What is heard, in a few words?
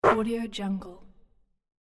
net swoosh